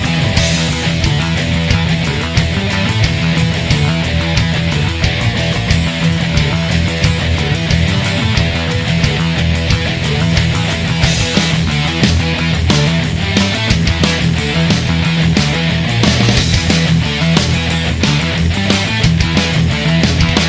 Rock; Goldeneye 64

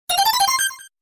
Gained Power

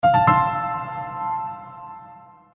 Piano notification

